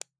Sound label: Ui Button Click Snap